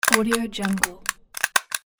stapler

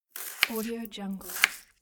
slice vegetable

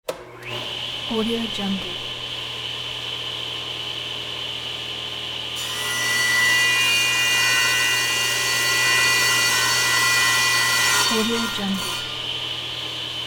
table saw making cuts

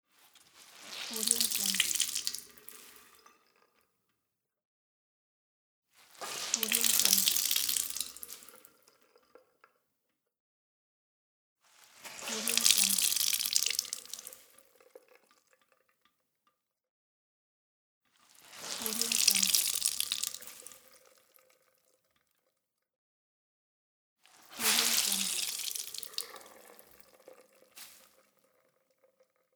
wet cloth wring out into the sink

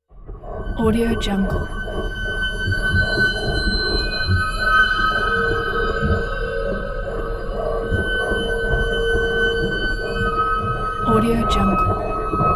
scary horror creepy sfx; kipaloops

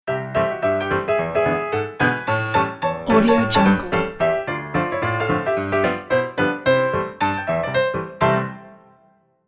funny jazz logo

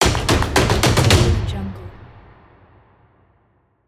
percussion achievement

